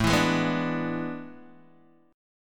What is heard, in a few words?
A Major 7th Suspended 2nd Suspended 4th, Guitar, Open D